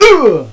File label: pain; JOSEPHIN